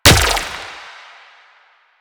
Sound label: Grenade Bounce; Warframe; Lasrian; Lasrian Soldiers; Weapons; Lasrian Chem Nox